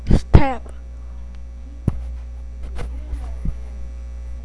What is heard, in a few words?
stab